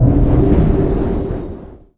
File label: Faerie Fire; Spells